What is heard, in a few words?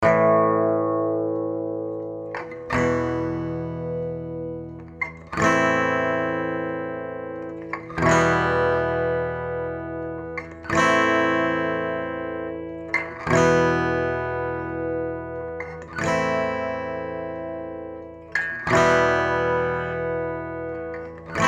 Chord changes